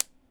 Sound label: tap